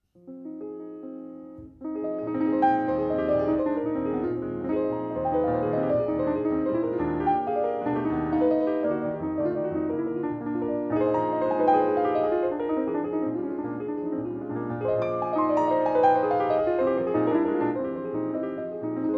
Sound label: Etudes for Piano, Allegro; 8 Etudes